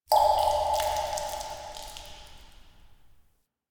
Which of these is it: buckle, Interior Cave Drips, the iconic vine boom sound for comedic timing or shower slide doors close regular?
Interior Cave Drips